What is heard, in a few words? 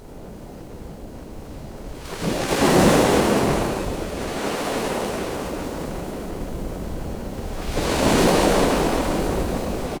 ambience ocean